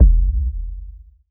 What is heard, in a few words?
DRM BD Clunky; Polyend; Plughugger